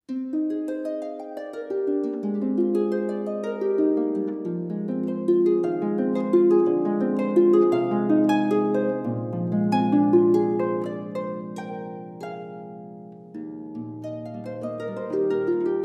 Arabesque, Harfe; Premiere Arabesque

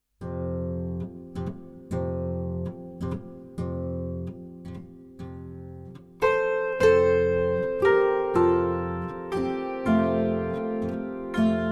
Canzone Zampognari, auf Harfe & Hackbrett; weihnacht

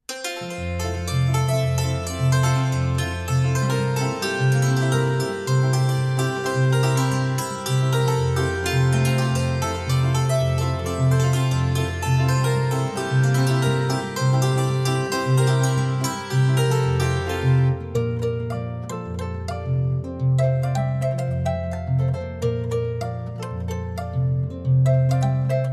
Merengue

